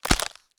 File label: BREAK Fast; stereo; Universal Sound FX; BREAKS & SNAPS